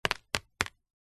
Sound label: nature water drips sudden short paper sheet